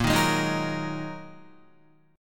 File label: Bb 7th Suspended 4th Sharp 5th Bb Guitar 4F,4A,5C,5G,5C,6E; Bb7sus4#5; strummed; 5,6,8,8,6,6; JGuitar